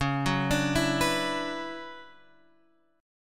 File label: D Major 13th C sharp, chord